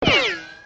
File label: bomb; Fighter Aircraft